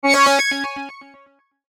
SYNTH SWEEPER RHYTHMIC, Sweeper, Suspense, Dark, Swell; Production Element, Imaging Element, Accent, Transition; Multimedia; Blastwave